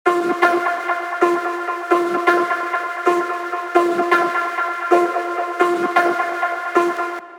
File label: 130 Loop Swung F